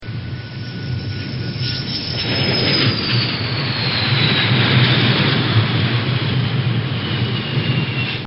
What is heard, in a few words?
Hawkeye Gruman E-2C Landing